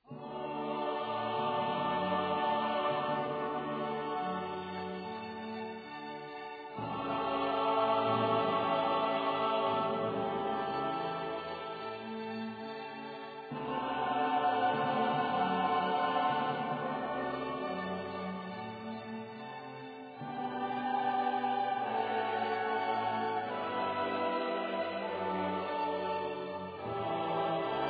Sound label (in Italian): W A Mozart Sanctus dal Requiem; Musica e Musica